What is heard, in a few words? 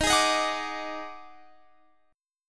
Eb Minor Major 7th Double Flat 5th, Guitar, Open F; EbmM7bb5; strummed